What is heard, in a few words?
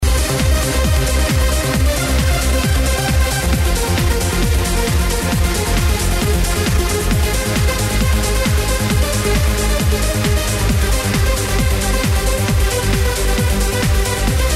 electronic trance uplifting